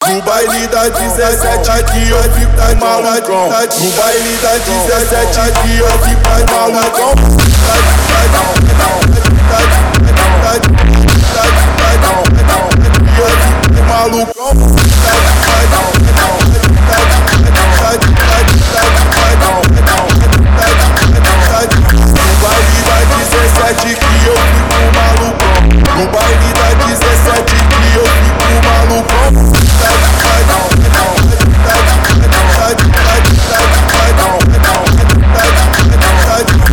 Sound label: brazilian funk